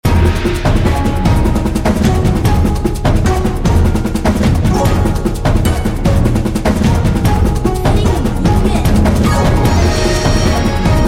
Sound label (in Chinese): 对战 激烈 紧张